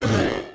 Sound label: damage critical; ACCRETIA